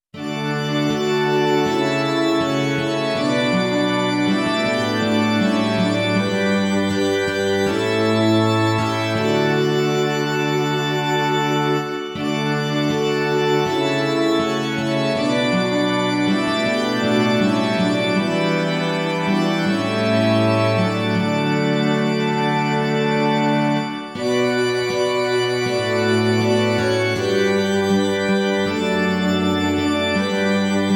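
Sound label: like a deer, Flute Strings Guitar Strings Organ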